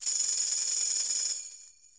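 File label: Tamborim FX; Latin Percussion; Drums; Drum Hits; Tambourin; Ableton; Factory Packs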